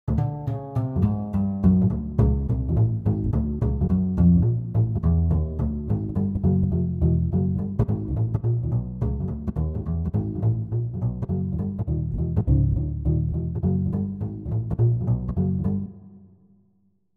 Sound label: Upright Bass Acoustic Double Bass; Bassesland; Syntheway